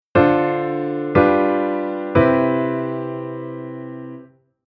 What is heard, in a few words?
Chord aug